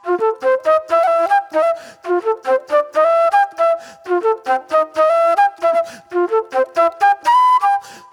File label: Sweet Soul Flute